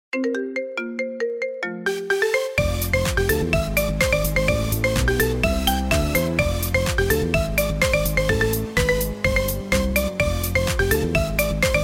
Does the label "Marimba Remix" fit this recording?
Yes